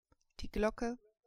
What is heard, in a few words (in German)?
glocke